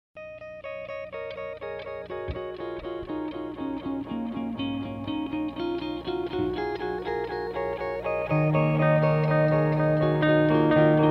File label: Jazz Thing